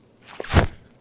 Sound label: Jumping On Grass